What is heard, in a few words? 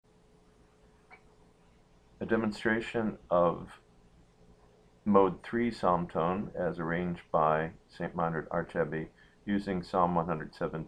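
psalm tone iii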